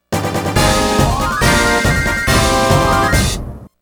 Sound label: Big Success!; atelier lina the alchemist of strahl original soundtrack